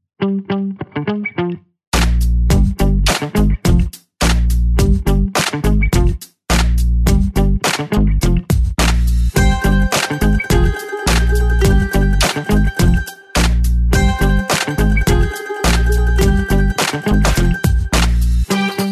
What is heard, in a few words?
Hip Hop